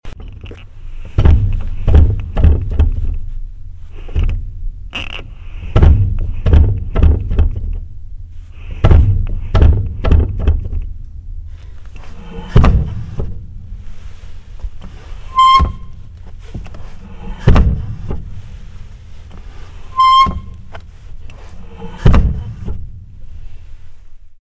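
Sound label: sounds of cinema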